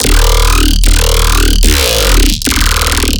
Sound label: BASS SHOT HARD GROWL D#